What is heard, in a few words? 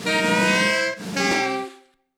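Pump breath; The Act; Arcade, iOS, MacOS, Mobile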